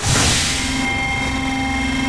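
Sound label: elevator move; XDM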